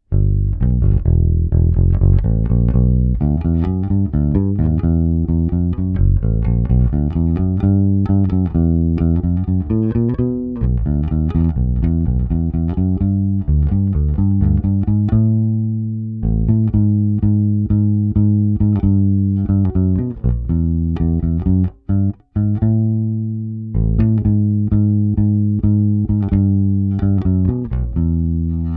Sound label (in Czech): Techwood hmatník - tónová clona stažená - původní krk s techwood hmatníkem, Precision bass, houbička u kobylky; Struny La Bella Deep Talkin Bass flatwounds, tónová clona téměř zavřená, houbička u kobylky; Téma: Jay Turser Precision bass JTB-400C-BK-A-U